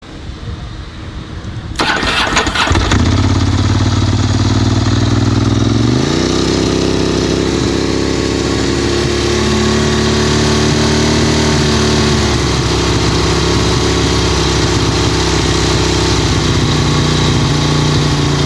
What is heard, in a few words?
ss900 termignoni